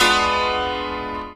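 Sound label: ZIMBAL PIZZI; Best Service; World Colours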